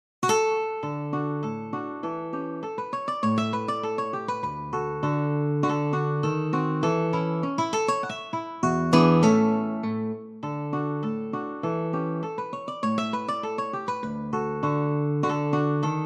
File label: Polka, guitar